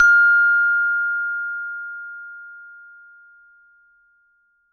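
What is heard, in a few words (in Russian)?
вибрирующий звук ноты ре 5 октава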